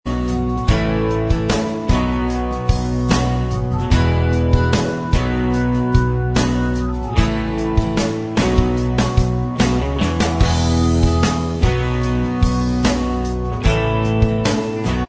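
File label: instrumental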